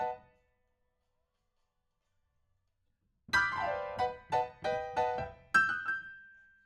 PIANO, RNR for piano

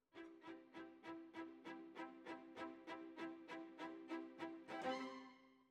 Violas, jazz for piano

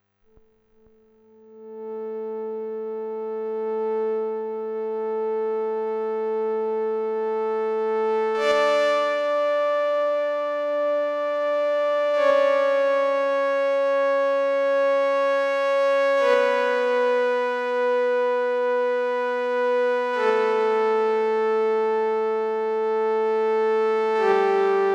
Synth

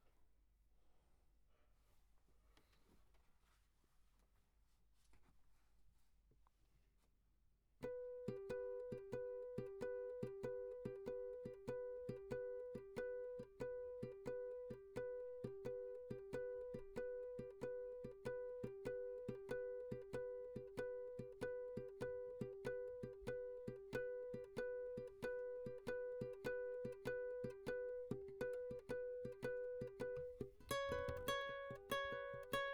Classic Guitar

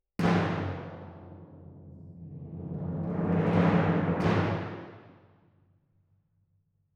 Timp

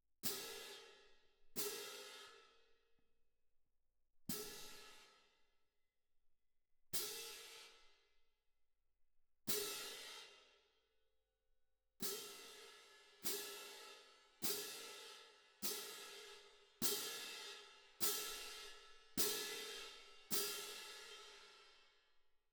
Perc

